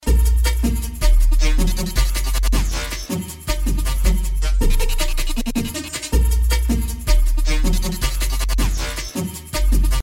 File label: zulu african ringtone; i phone 4s